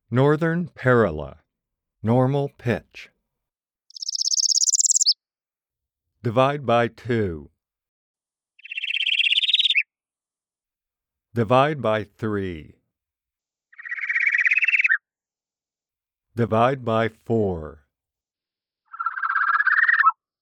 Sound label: Northern Parula